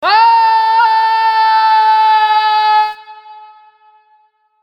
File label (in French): Aaaahhhhh homme